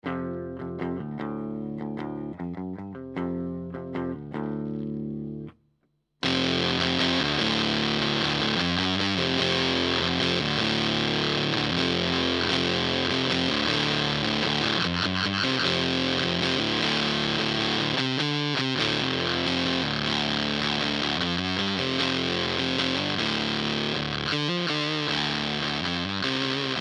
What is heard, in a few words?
Fuzz; death by audio total sonic annihilation